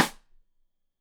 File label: Side Stick Stereo; Deeply Sampled Drums; SMDrum